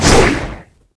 Weapons Swing

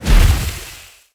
Magic Shot

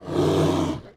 hit

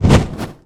wing

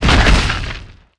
DROP

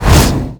Swish

